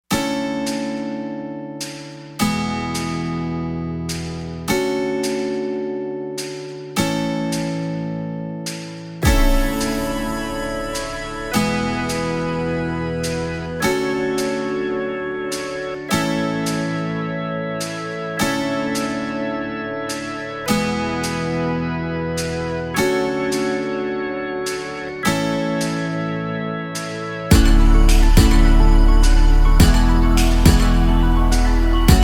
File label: Why Instrumental